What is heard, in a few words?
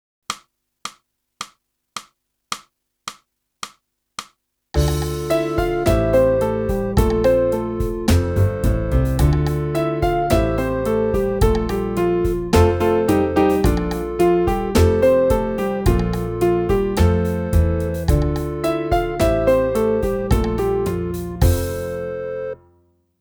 sax